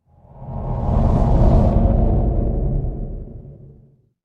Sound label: magic scifi portal